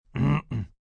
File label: Cough Short Clear, Clearing throat, male, short (human voice); Sound Effects:Human; VoiceBox